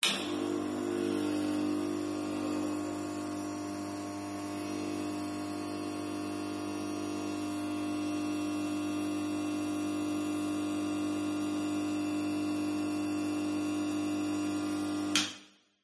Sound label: industrial warehouse light turn on buzz hum starter off room reverb; zapsplat